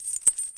found sound kette; UNUSUAL KITS and FX; Werkstatt Found Sound Kit

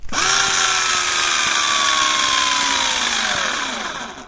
found sound bohrer leer; UNUSUAL KITS and FX; Werkstatt Found Sound Kit

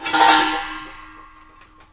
found sound blauer eimer; UNUSUAL KITS and FX; Werkstatt Found Sound Kit

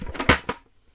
found sound blauer eimer; UNUSUAL KITS and FX; Werkstatt Found Sound Kit

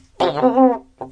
found sound ovenrohr; UNUSUAL KITS and FX; Werkstatt Found Sound Kit

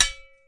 found sound schaufel du spaten; UNUSUAL KITS and FX; Werkstatt Found Sound Kit

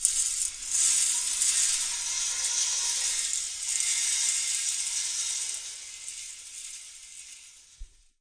found sound ovenrohr; UNUSUAL KITS and FX; Werkstatt Found Sound Kit

